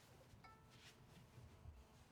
Viola C

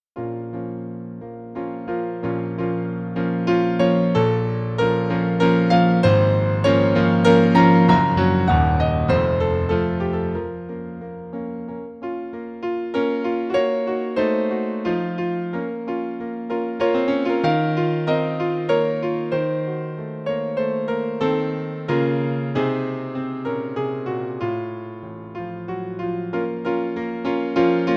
Piano

